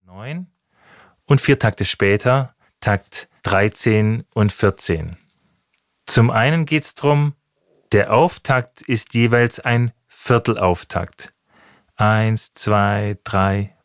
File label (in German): Rhythmus